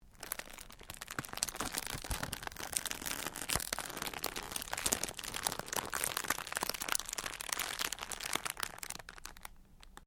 Cellophane crinkle; Material components for constructing more complex sounds; Plastic; Materials

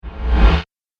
Multimedia Swipe, transition

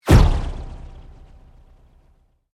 Sweep Mechanical Fast

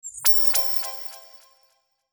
Sweeper Squeaks Synth Delay High; Production Element Imaging Element Accent Transition; Sweeper Sound Effects

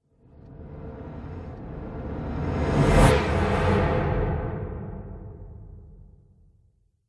Trailer Hit Riser, Sonic Logo Sound Effects; Cosswind

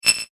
multimedia rollover, Multimedia Rollover Tone

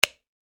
On Or Off Light Switch; found sounds

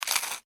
human eat crunch rye crispbread; Human Bite/Crunch Rye Crisp Bread; Eating; McKinney Sound

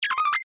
UI Confirm, User interface feedback sounds

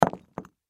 Impact Brick Drop; Drops; Sound Effects:Objects; Blastwave

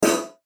CLICK HIGH TECH; Clicks; Blastwave

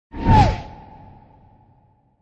Wipe Short Tail, Fast whoosh, called a wipe; Fast whoosh, called a wipe, for video editing, movements, logos, flash, or animation; Wipe Sound Effects

